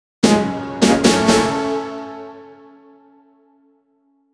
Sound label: rising strings and a ligh, snare